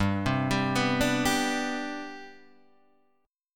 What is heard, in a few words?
C Major 7th Suspended 2nd, G, chord